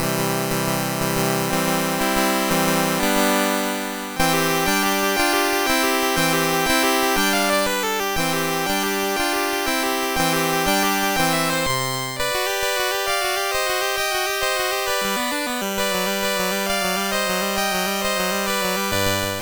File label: MOS6581; MOS6581R3